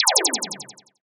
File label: science fiction laser; sound effects one